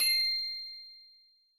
Get Collect Coin Empty; SM3DL

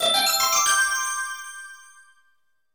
5-Up

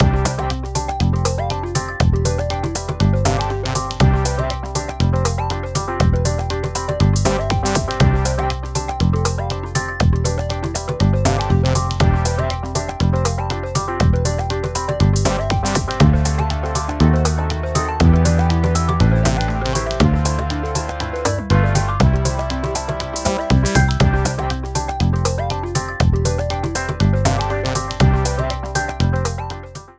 taking a photo

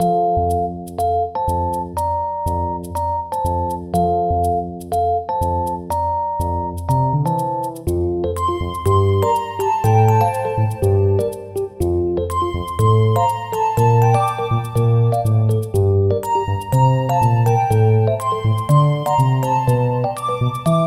Underwater Theme; SM3DL

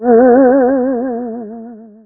Ghost Small Oooh, Sound effect; DK64

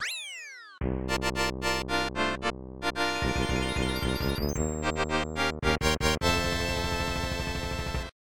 Sub Castle Clear Fanfare

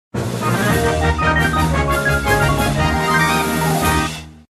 Well Done Clear

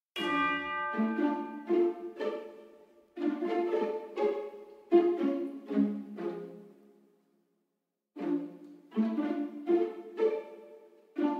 Spooky Village; OGS

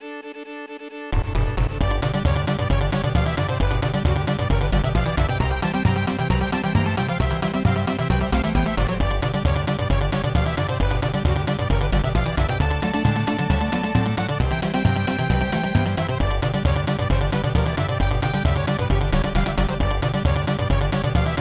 Mountain Railroad; SMRPG; List of Super Mario RPG: Legend of the Seven Stars media

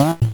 Swallow; SMA2; Yoshi

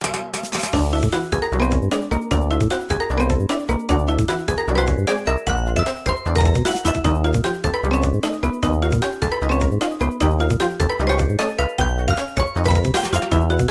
Teleporter Theme

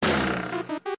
Lose Theme (short); DM64

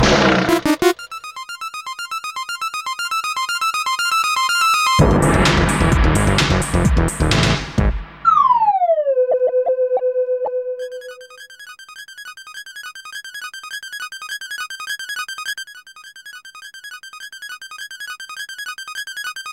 Lose Theme

